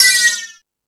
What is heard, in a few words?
Hip Hop, Drum Machines E-MU Pro-Cussion